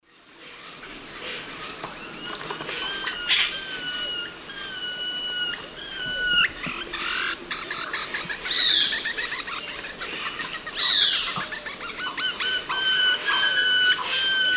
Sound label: Nasica longirostris, song and call; S. America; Eastern Lowlands